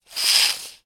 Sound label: industrial tools tape measure metal extend; sound effects one